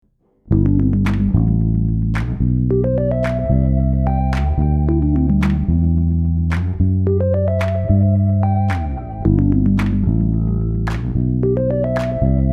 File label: Gmaj on the E; Yamaha